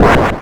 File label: slam